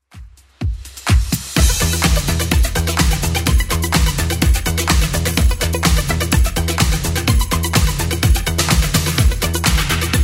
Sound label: 80s Club Edit 126bpm